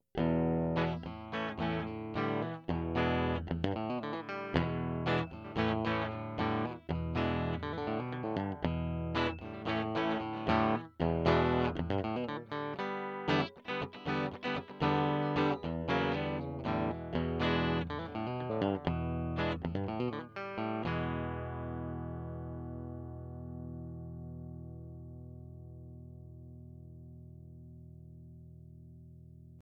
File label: Bridge pickup clean, Tele